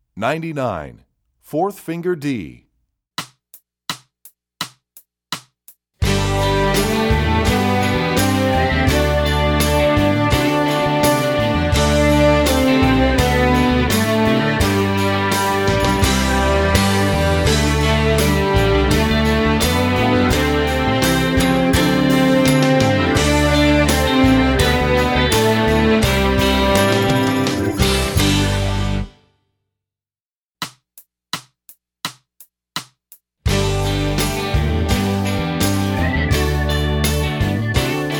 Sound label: Fourth Finger D, Strings; EE2000 Book1